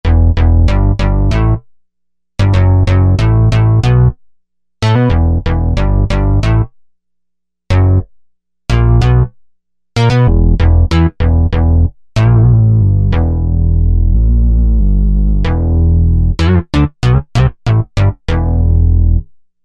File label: Dark Moog, Korg TR-Rack